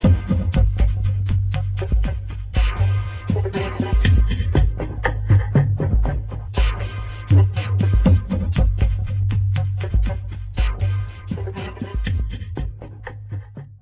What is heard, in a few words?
train dub